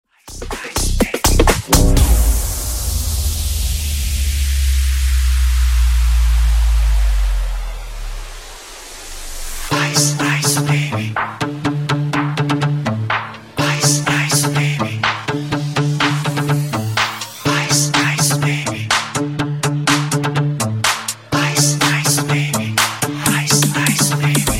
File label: Funk Remix 128Bpm